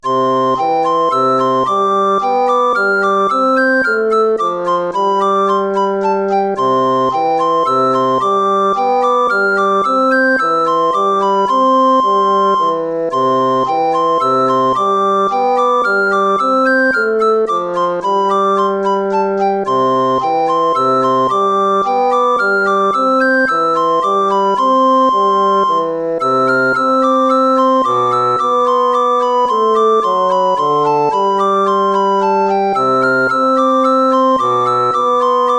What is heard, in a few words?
tempo minuetto; hook james